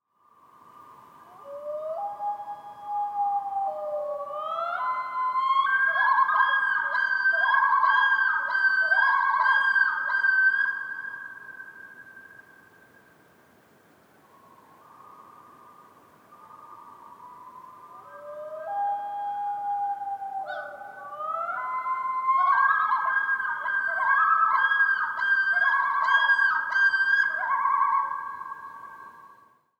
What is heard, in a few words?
loon